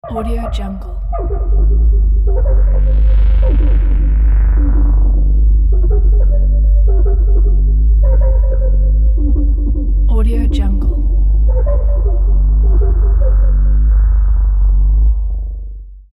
space swarm; sound ideas

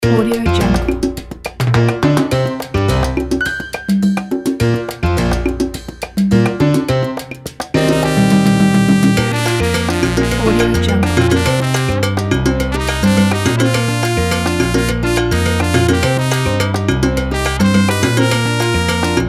salsa

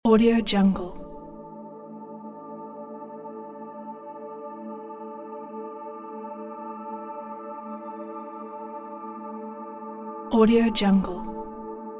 soundscape piano ambient

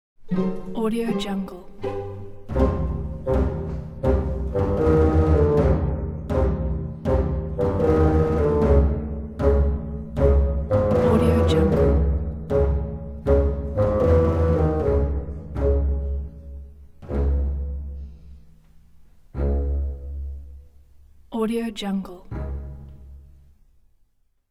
approach by stealth; earnsidemusic

